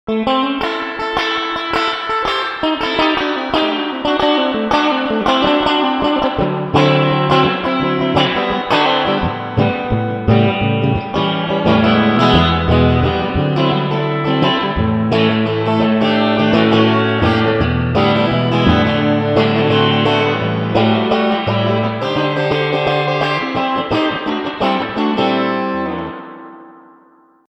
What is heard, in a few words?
Twang